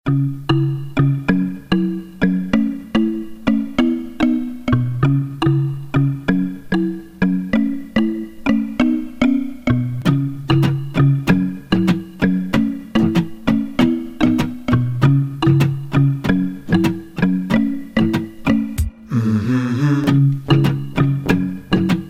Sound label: Loop Instrumental